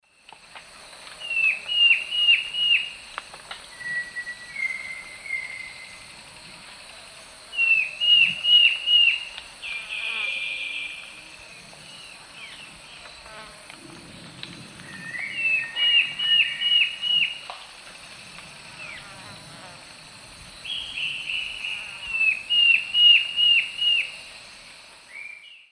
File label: Schistocichla schistacea, song; S. America; Eastern Lowlands